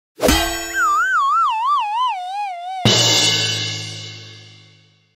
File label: head hit dizzy